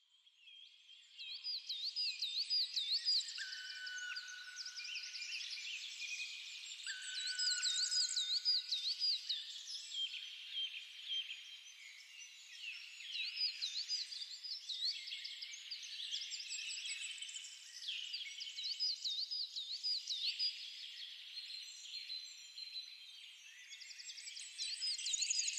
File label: Forest Birdsong; nature sound